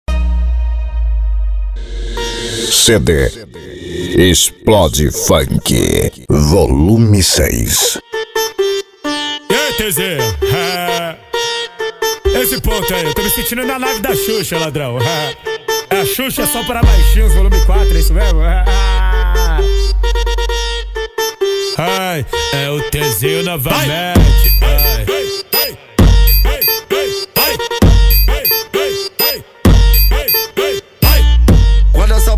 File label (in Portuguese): explode funk